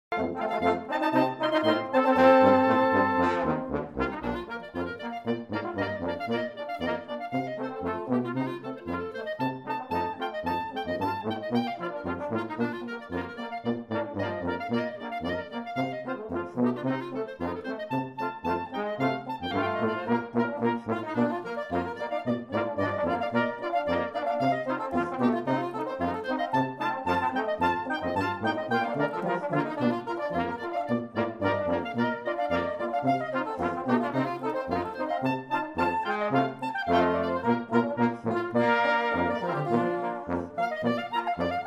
German Band Clarinet Polka; Seattle

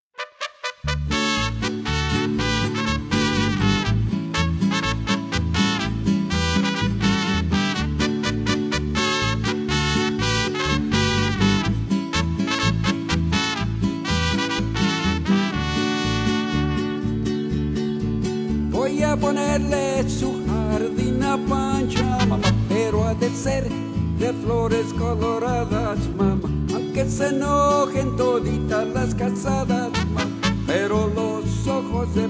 Mariachi

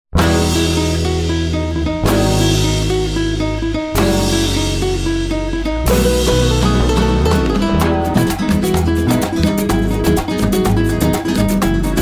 Latin Band

